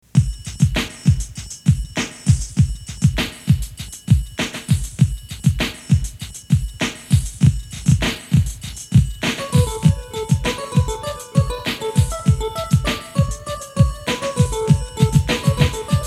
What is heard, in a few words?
guitar beats